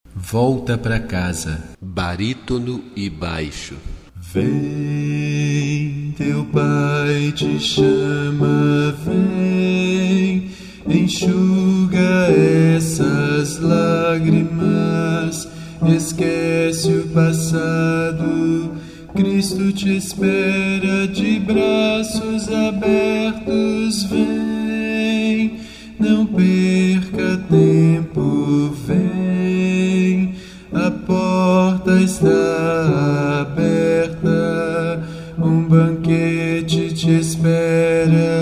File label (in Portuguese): volta pra casa - barítono e baixo; coral vozes da esperança; kit de vozes